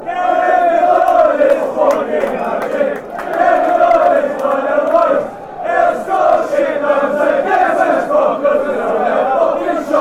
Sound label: wolverhampton wanderers chant